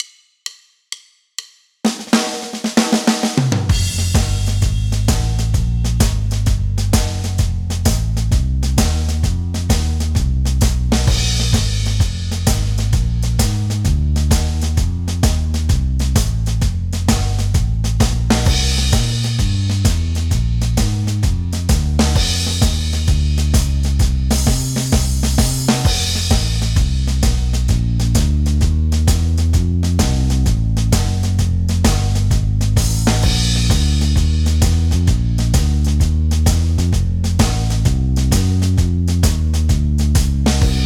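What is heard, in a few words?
blues in F, triplet rhythm, without harmony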